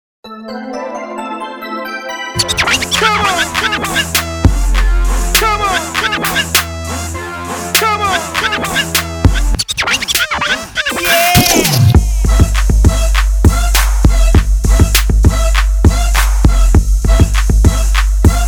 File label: Opening Music(Instrumental)